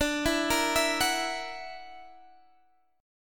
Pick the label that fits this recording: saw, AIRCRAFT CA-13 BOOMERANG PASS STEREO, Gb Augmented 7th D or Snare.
Gb Augmented 7th D